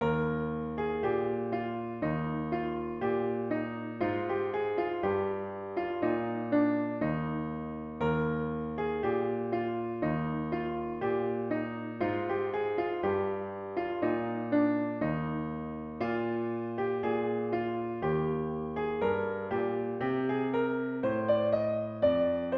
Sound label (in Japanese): ひいらぎかざろう（ピアノ）